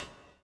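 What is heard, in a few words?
reverb walk, footsteps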